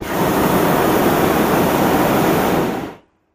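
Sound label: Hot Air Bllon Burn Cl, Hot Air Balloon Burner, Close; Medium Hard On, Off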